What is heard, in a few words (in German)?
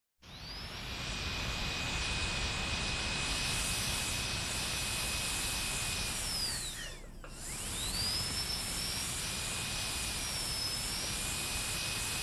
zahnarztbohrer; Alltagsgeraeusche